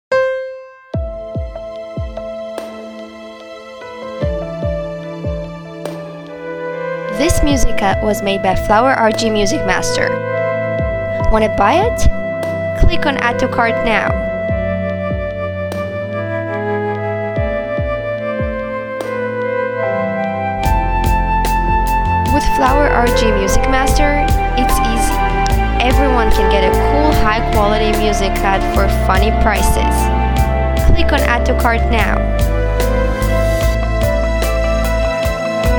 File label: music rhythmic gymnastics